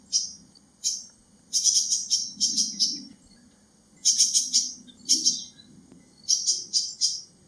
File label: Little Spiderhunter calls; Kledang Sayong Forest Reserve Ipoh Perak Malaysia